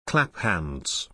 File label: clap hands; body percussion